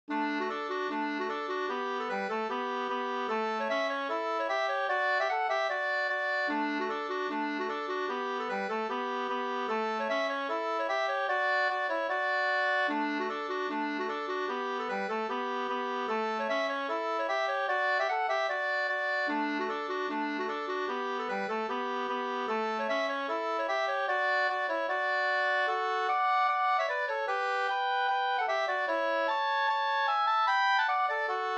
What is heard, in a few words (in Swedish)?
schottis; Blyberg